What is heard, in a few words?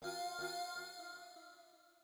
chime; chime sharp; only way in chime 8